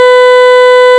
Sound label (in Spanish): sierra